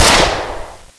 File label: ak47; svencoop